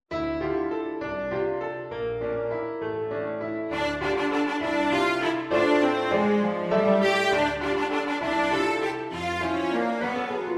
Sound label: CELLO Energico